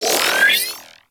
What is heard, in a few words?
Ship Data Scan Start; Ambience; Props; Warframe; Corpus Ship Remaster